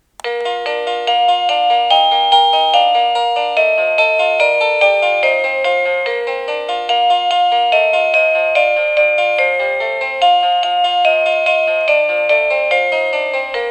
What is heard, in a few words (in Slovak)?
Ukážka melódie zvonenia zvončeka